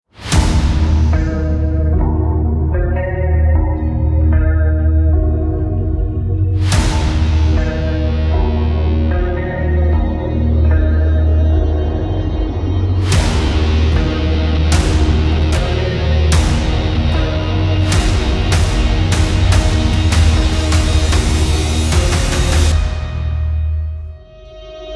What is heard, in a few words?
strain no solo vox